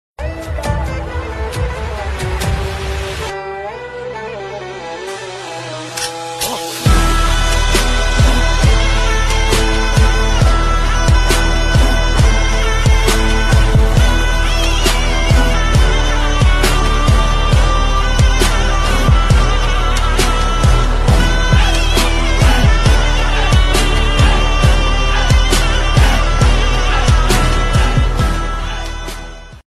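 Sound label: Cartoon English Music Ringtone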